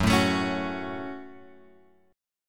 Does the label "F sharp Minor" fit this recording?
Yes